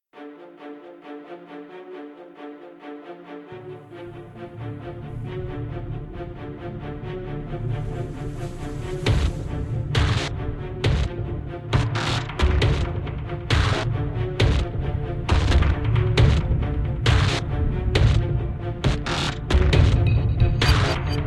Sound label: Contemporary High Intensity; Sherlock Holmes The Devil's Daughter